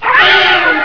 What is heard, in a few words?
PIG; 动物; 家畜; 猪; 特酷声音素材库